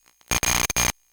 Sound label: glitched tones AM Radio Interference